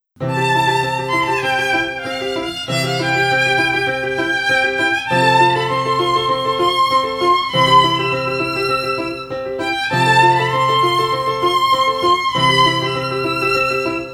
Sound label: Violin; 冒険ピエロ